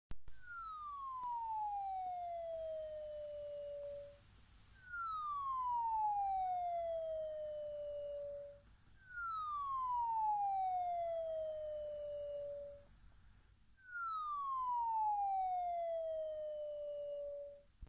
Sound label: hyp chirp down